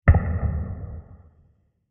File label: Short Dark Explosion; Explosions; Crafted Signals